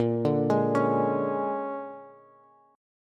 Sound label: A sharp 7th Sharp 9th A sharp down Bass; A#7#9 arpeggiated; JGuitar